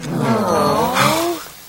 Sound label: dissapointment gasp